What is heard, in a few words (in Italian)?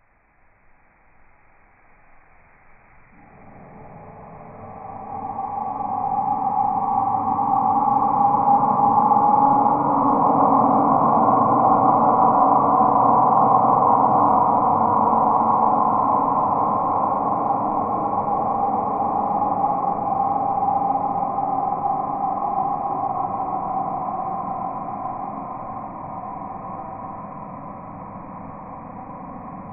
barbagianni; FILTRATI E RALLENTATI; I 7 CANTI